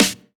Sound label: Snare; Vengeance Samples